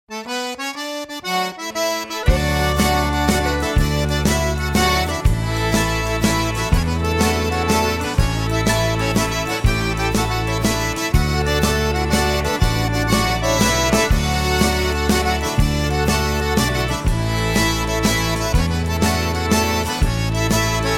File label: Cajun